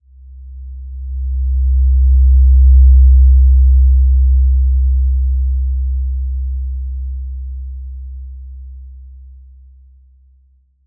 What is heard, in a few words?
Simple Glow C2, healing soundscapes; HSS-Custom-1